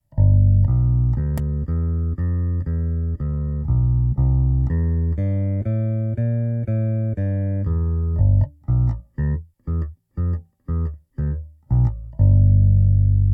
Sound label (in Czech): Ibanez SRX 700 - simulace pro baskytaru; NUX NPB-5 Melvin Lee Davis Bass Preamp & D.I. Box